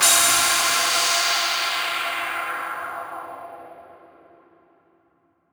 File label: FX Impact